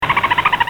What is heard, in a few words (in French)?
Dauphin excité, Animaux; bruitage